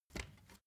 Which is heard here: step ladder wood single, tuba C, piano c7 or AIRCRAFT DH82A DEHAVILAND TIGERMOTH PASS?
step ladder wood single